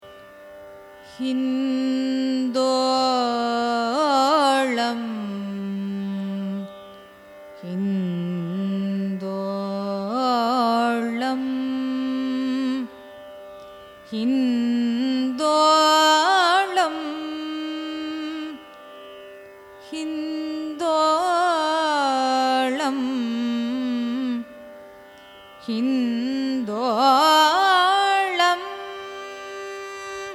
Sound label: raga hindolam signature; carnatic music